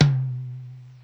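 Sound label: pss795 tom Cool, percussion; Polyend; Legowelt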